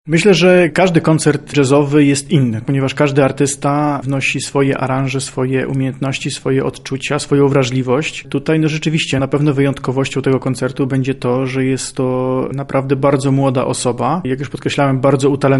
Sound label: jazz; koncert; mloda saksofonistka